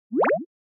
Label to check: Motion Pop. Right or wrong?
Right